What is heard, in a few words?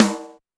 Snare, Pitched Up; Clavia DDrum-AT